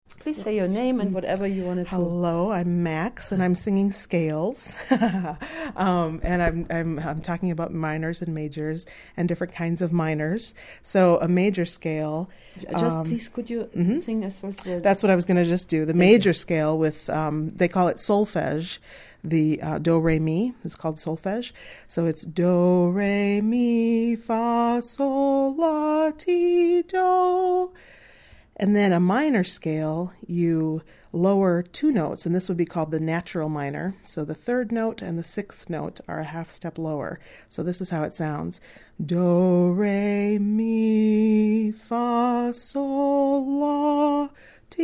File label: Major Scale; Reclaiming WinterWitchCamp USA 2012